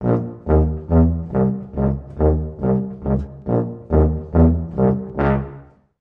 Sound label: Casio Tune; simpsons hit run; ps2